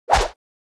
Imp atk; FlyForInsanity

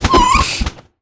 Npc Fly bat Dmg; FlyForInsanity

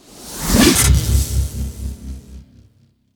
Dark illusion; Fly For Insanity

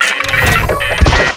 Steam Walker Die; FlyForInsanity

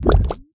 jam sfx; Candy Crush Friends

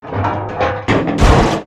Steel Knight die; FlyForInsanity

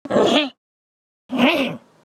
Big Soldier Raccoon Idle; FlyForInsanity

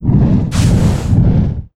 Skill fire magic; FlyForInsanity

